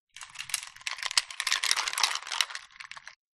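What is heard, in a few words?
Ice Glass Swirl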